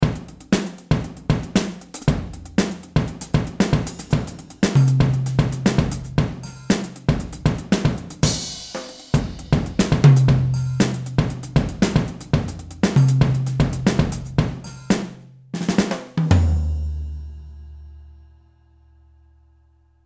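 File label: Funk DLX Ludwig Mod Orange, drum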